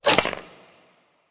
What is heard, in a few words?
Gain Mithril